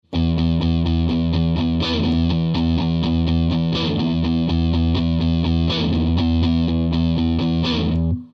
riff, gitara

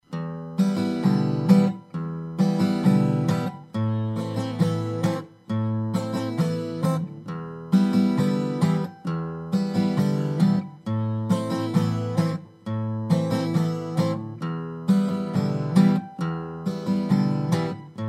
zwrotka palce tlumienie, gitara

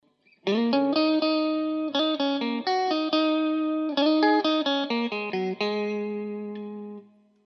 zagrywka blues wejście bridge es-moll

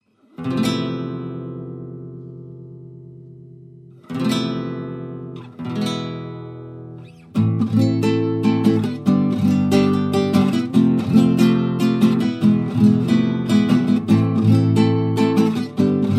brzmienie akordu F7+add11+

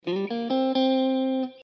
zagrywka blues wejscie na bridge zagrywka G C, gitara

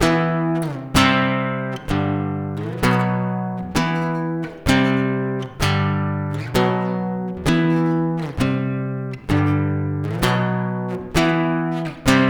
refren, gitara

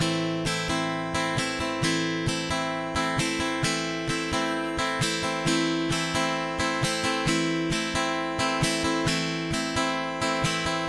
bicie 4/4 w tempie, gitara; Lekcja 1

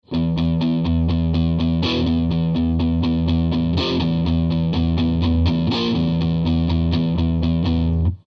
riff akcent na 4 i

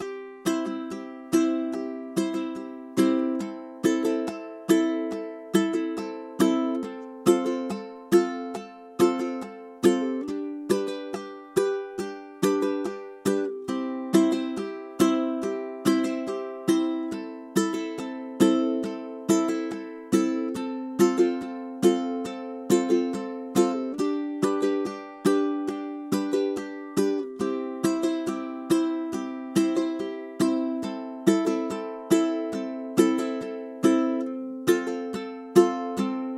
bicie ukulele

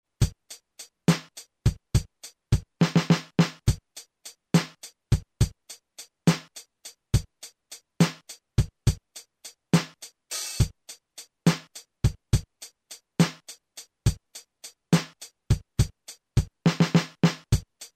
perkusja ballad tempo 104; ibanez ballad1

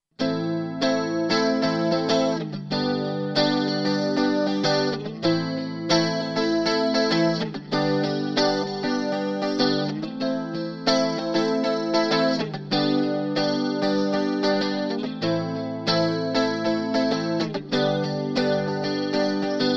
rytm samby

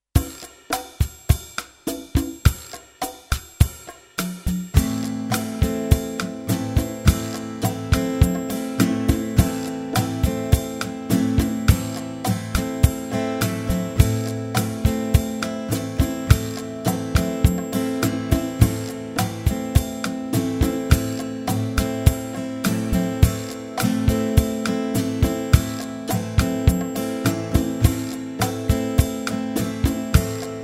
bicie 4 4 akord a moll tempo 104, gitara

